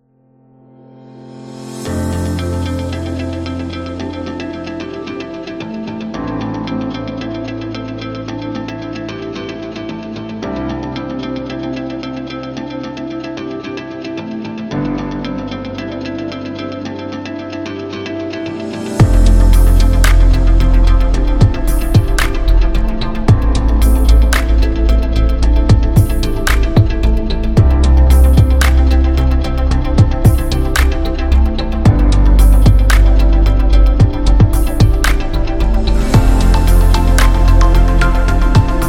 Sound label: Technology Beat; friendly